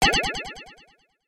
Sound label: wrong Sound; JumpinJack